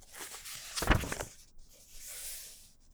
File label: Page Turn; Books, Paper, Writing